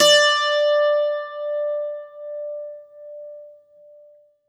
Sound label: bouzouki